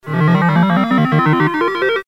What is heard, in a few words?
number rollup; Fluffy Favourites Remastered